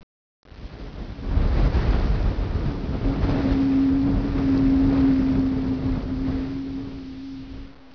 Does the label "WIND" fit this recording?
Yes